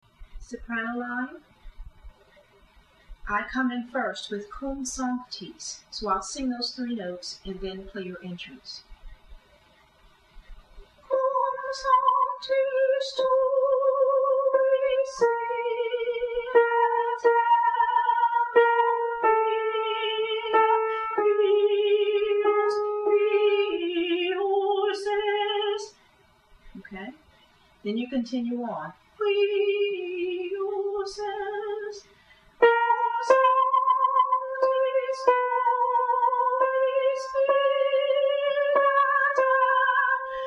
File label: Agnus Soprano